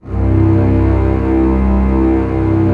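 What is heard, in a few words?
strings C0 FF L; Sound On Sound - Peter Siedleczek's Orchestra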